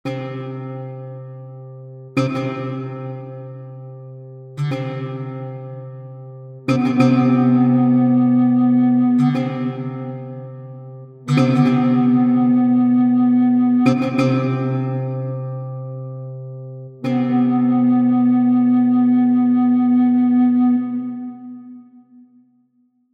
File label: medieval standoff